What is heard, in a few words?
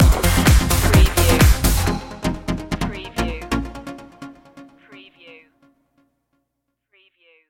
let go stinger